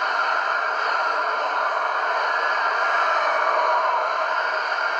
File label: banshee loop; Crypt of the NecroDancer